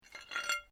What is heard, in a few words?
Glass move quiet; Material components for constructing more complex sounds; Materials